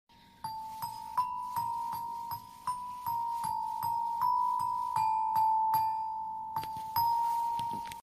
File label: creepy bells; ringtones